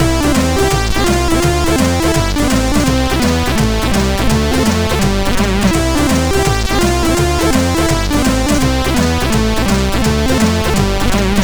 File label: tune, MOS6581R3; Aaaargh